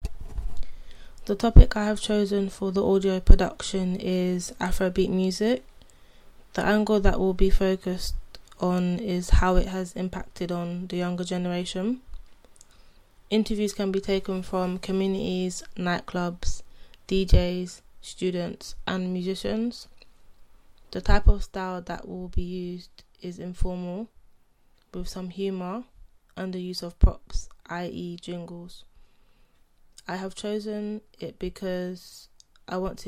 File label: afrobeats